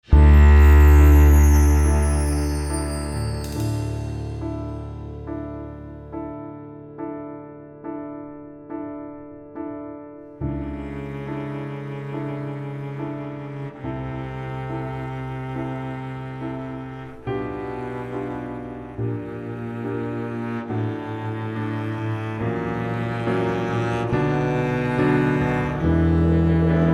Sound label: D Major Accomp